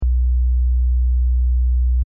sabbass serum

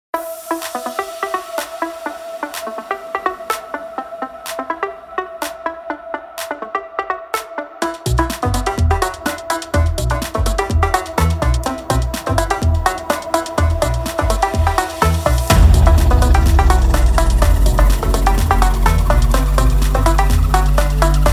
House Essentials; Usynth DRIVE

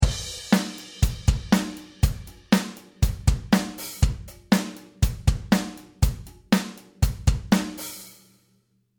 ezd3 bright; EZ DRUMMER 3

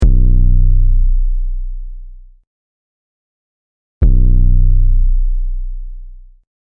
sabbass; sablab

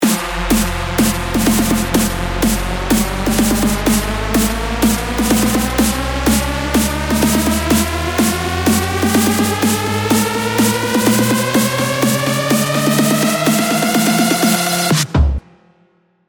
riser

